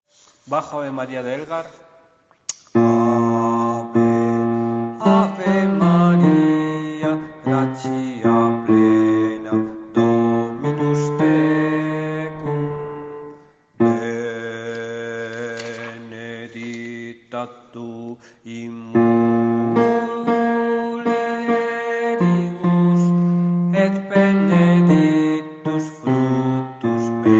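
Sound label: bass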